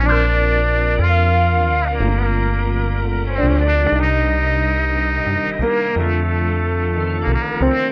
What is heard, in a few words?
horns; loops; Phonky